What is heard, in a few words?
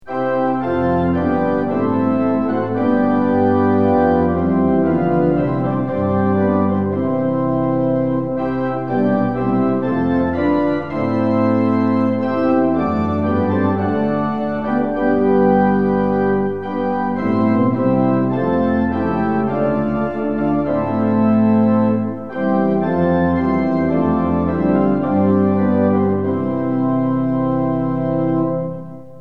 tune; hymns; lillenas